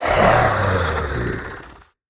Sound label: Electrophorus sound